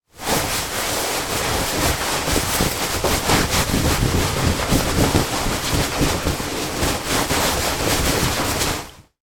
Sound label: foley person drying themselves with towel